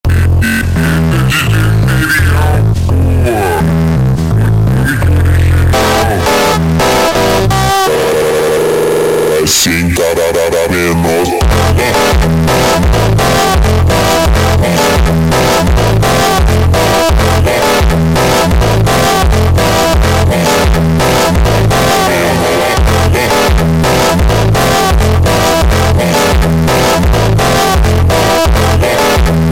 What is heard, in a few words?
Montagem ultra Slowed